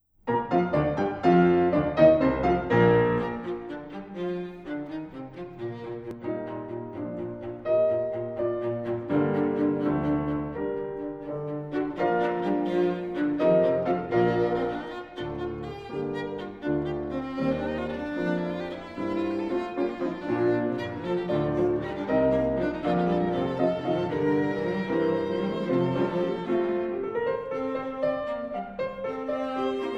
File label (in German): Feurig Klaviertrio